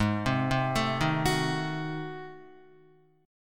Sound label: G sharp 7th Flat 9th G sharp down Guitar, chord; G#7b9; arpeggiated; JGuitar